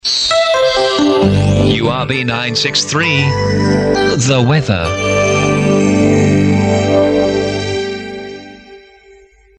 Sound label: the weather bells bed